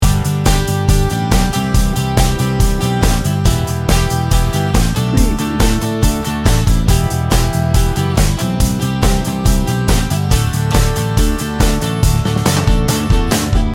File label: pop rock feel good loop